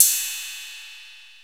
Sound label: Crash, Cymbals